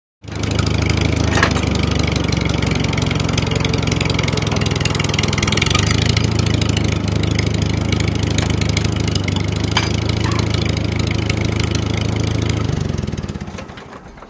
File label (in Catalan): Tractor